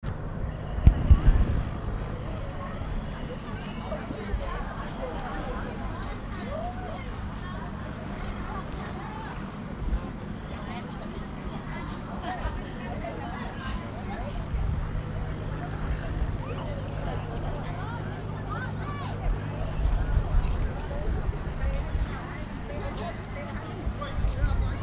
people enjoying outside park, phnom penh, Cambodia; 2019